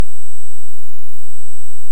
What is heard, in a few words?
Hydrophone; OneOcean